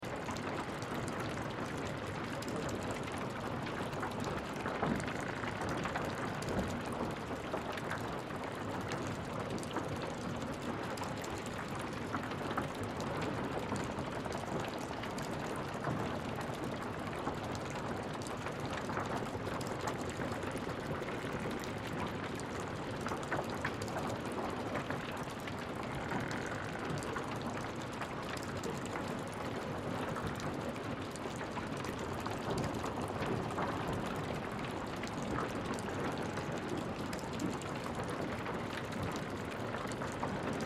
Fire Aftermath, Fire Aftermath Scene Interior Structure Wood Stress Water Drips Loop; Emergency; Flame